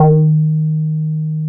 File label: D#3, Bass; E-MU Producer Series Vol. 6 – Analogia Project (CD 1); Boom da Bass 2